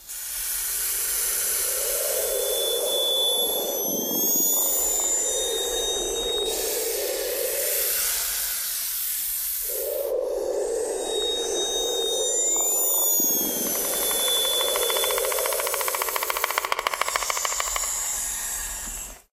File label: subject ambient; video games; source engine; portal2